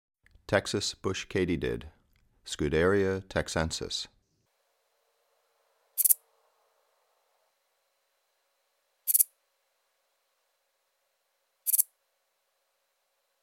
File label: Texas Bush Katydid, Scudderia texensis; Crickets and Katydids